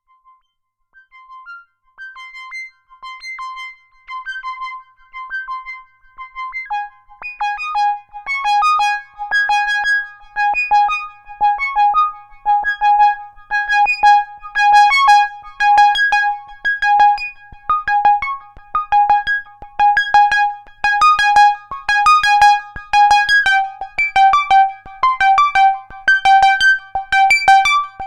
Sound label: Model15 High Sequ, synthesizer